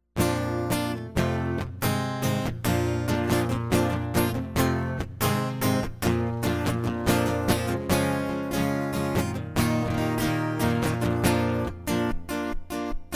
acoustic

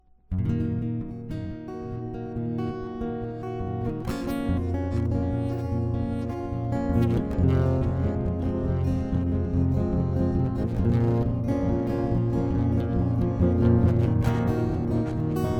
acoustic

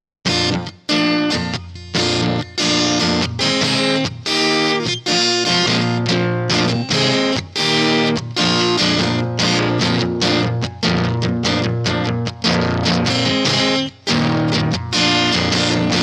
electric; MegaEpix

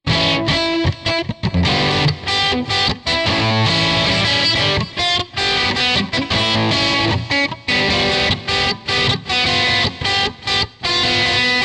electric

